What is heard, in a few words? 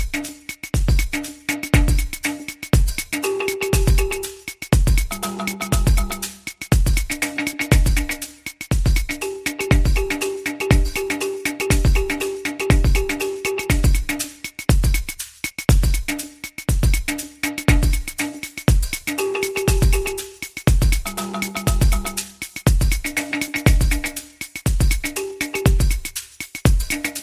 Afro Disco Beat